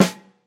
snare single hit F sharp key, Royality free snare tuned to the F# note. Loudest frequency: 1170Hz